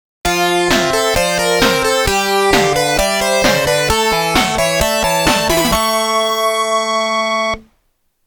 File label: RETURN IN TRIUMPH (STAGE CLEAR); R-Type Original Sound Box